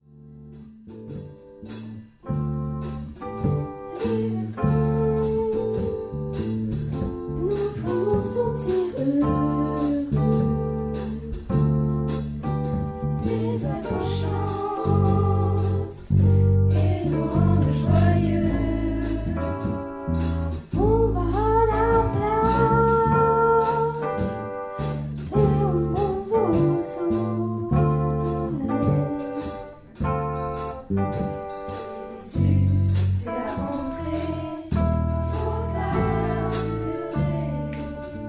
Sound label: jazz